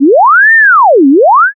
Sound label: police siren